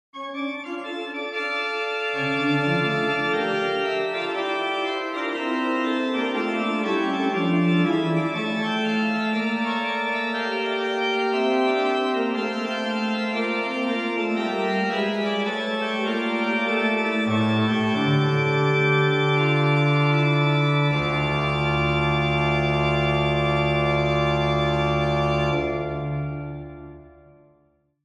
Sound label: Messe Noel, OrganMusic; Fessy & Mine; Souvigny